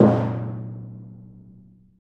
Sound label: Af. Hand Drm, Ambient; Roland LCDP14 Africa VOL-2; Ambient Tar